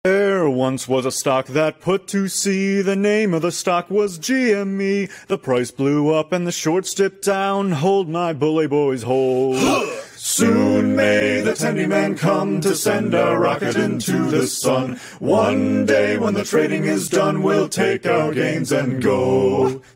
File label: shanty